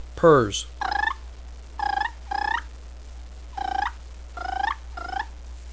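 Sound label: purr, turkey call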